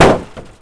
building hit; WAR

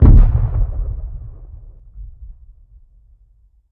distant impact; WAR

